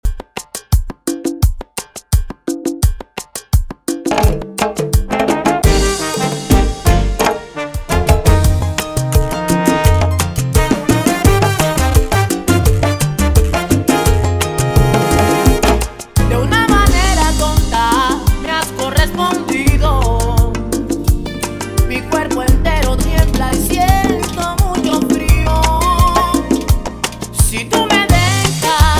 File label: vida sin sentido 85bpm; camaguey